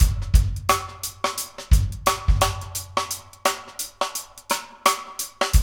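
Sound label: 84 bpm, Funky Drums; AMG; Now CD-ROM (Roland); Neil Conti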